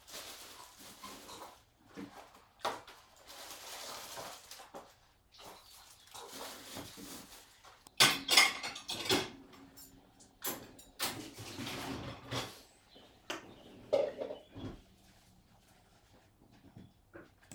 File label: Kitchen Sounds